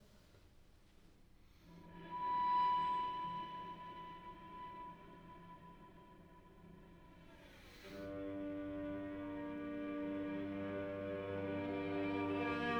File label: Cello sound